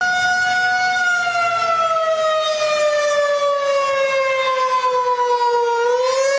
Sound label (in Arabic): انذار